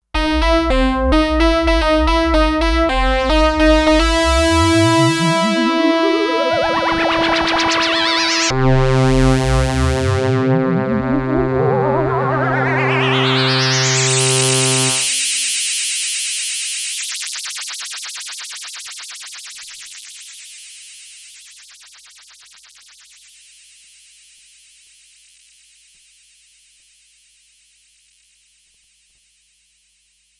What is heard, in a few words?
Stack example, Sequential Circuits Six-Trak; Stacks